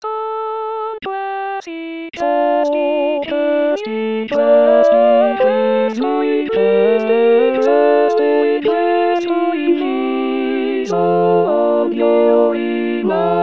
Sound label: tutti; canzonette 1ton dessous; rimailleurs